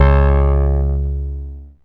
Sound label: C#, PIANO; Big Fish Audio; Synclav FM